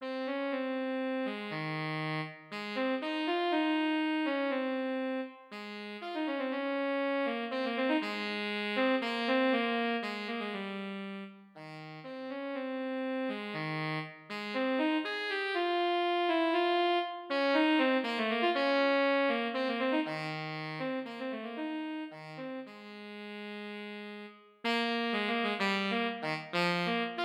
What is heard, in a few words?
tenor saxophone